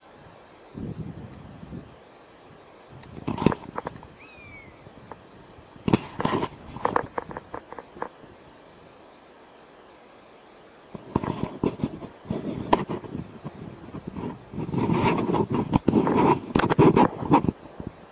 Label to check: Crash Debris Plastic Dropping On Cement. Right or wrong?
Wrong